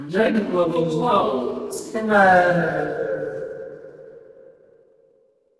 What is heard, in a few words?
crowd murmur